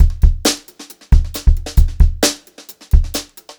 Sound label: beat, Brushed Drum Loops; USB Soundscan vol.39; AKAI; 90 s Sample CDs; 133 HOTROD